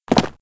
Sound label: Land